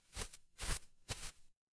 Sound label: paper fabric cloth whip